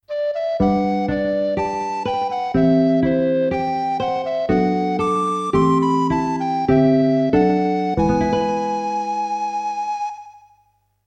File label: Music Good Night